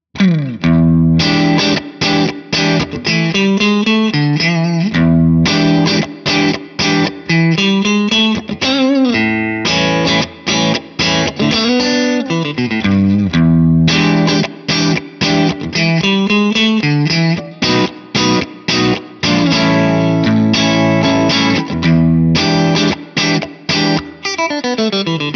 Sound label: breakup